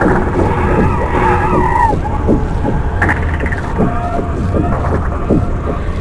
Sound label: dark loop; Shadow Man